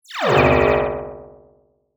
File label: beam